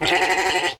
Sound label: sheep; mob